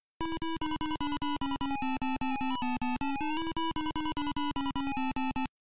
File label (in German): bewegung spieler; Dig Dug